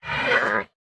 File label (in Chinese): 熊猫无法繁殖声